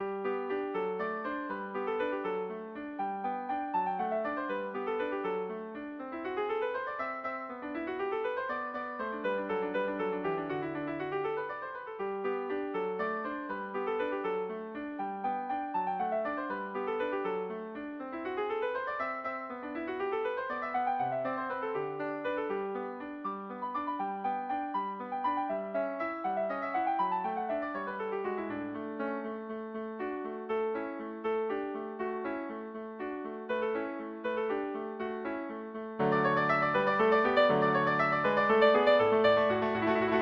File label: Sonatina, piano